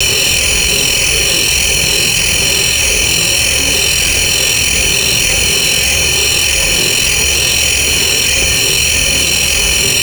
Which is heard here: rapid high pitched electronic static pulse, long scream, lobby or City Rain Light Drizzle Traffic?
rapid high pitched electronic static pulse